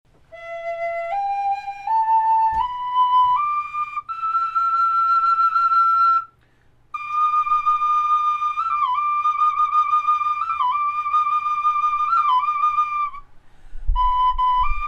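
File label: natural, flute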